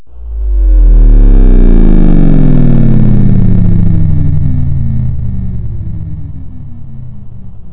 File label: SWOOP; Yarozians